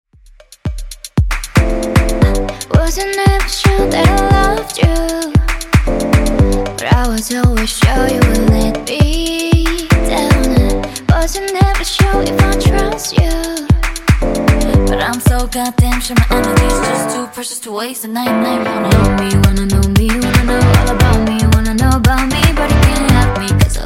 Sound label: Intro Outro 115Bpm